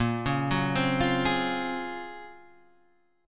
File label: Gb Major Sharp 11th Bb, guitar